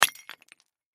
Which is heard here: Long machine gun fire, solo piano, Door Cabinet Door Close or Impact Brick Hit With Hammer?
Impact Brick Hit With Hammer